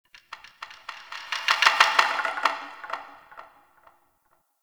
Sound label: Wood Stick; Warframe; Ambience; Clan Dojo; Dojo Randoms